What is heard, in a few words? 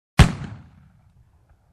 Flare Gun Shot